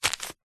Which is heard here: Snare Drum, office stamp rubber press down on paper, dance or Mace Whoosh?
office stamp rubber press down on paper